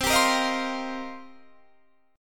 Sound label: C Minor 11th C Guitar DADGAD; Cm11 strummed